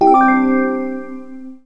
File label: match medium; Audiosurf; chillout